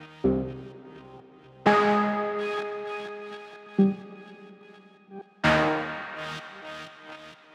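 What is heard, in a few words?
Synth Loop, 127bpm, C minor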